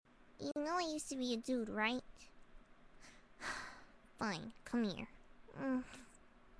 *sigh* *makeout noises*; You know I used to be a dude right? *sigh* Fine, come here.

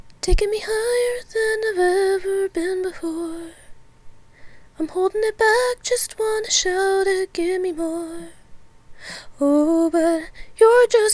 sings a part of, singing while getting sick; F4A

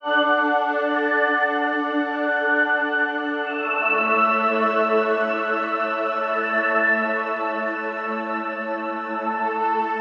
Theme song (Ambient Version)

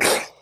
Gag; Lewd SFX

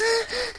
Moan

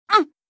VOX Get Hit; Li Li; Heroes of the Storm; warcraft

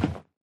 Bed Pound; Lewd SFX

